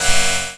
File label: Trap Hit; Sonic Foundry (Sony Creative Software); Esoterik Beatz